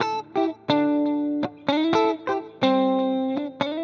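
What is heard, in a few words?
electric guitar, ethno, 125, A; eJay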